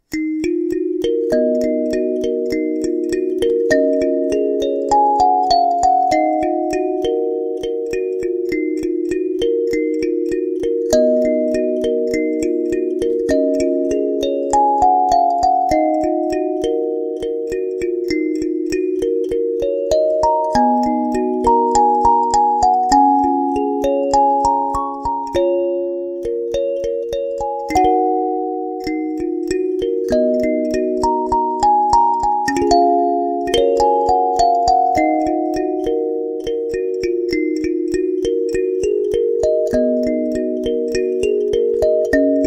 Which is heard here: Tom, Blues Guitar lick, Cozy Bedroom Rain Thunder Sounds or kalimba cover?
kalimba cover